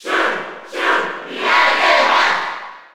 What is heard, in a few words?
Cheer Spanish; Shulk; SSB4